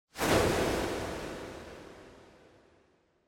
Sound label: Camera Motion Reverb; シーン・カメラモーション